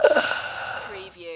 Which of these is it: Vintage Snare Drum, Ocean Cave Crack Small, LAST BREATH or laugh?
LAST BREATH